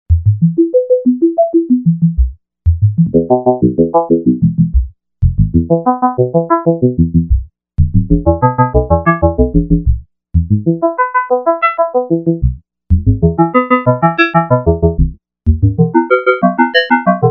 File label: Ratio, VCDO